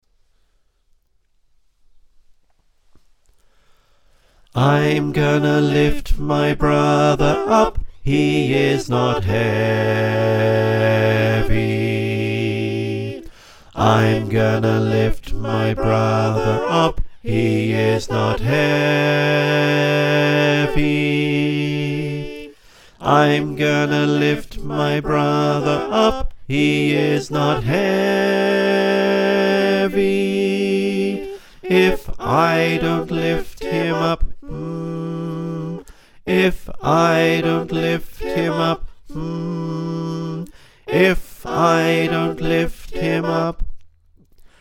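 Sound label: bass, choir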